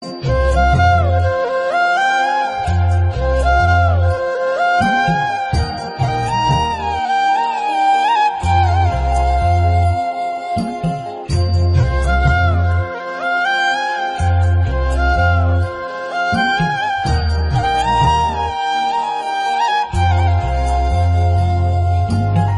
malayalam flute ringtone

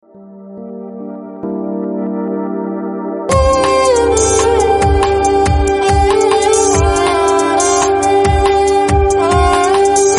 Sarangi, hart break

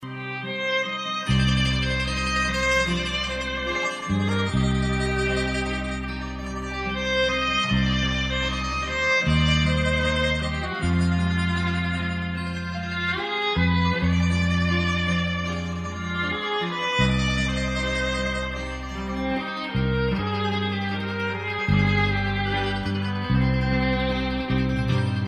violine; Electronica

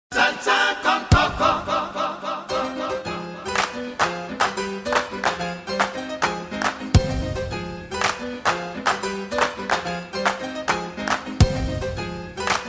salsa; Latin

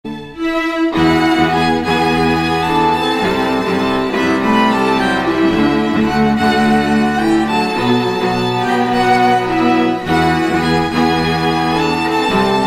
skripka

